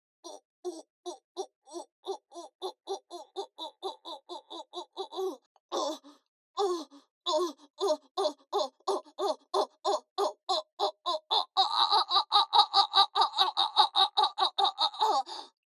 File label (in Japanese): 喘ぎ声